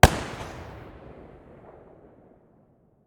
muzzle protecta mono far, weapons

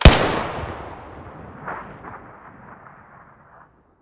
tt33 muzzle stereo

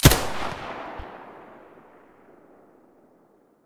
muzzle mp9 stereo, weapons

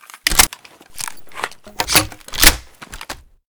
b725 ammo change, weapons

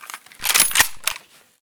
misfire; weapons; vityaz

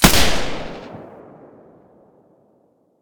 silencer g36 mono, weapons

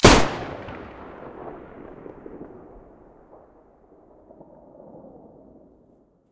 muzzle raging stereo, taurus

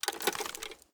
pkm draw

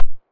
silencer mono far, weapons

